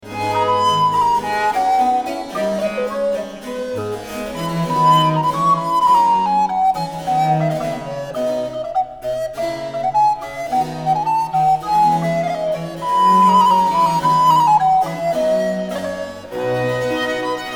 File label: concerto in A minor, allegro; SARRI (Domenico Natale)